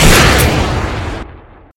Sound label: 50 sniper shot; Liam